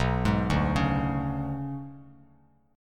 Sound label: B Minor 6th Add 9th B down Bass Standard; Bm6add9 arpeggiated; JGuitar